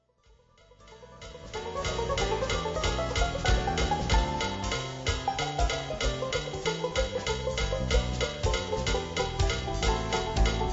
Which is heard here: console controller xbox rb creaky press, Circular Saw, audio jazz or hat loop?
audio jazz